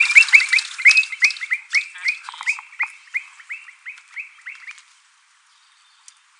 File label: nothura boraquira